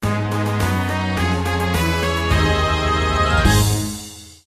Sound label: Mission Completed; wild west guns java android mobile gamerip 2011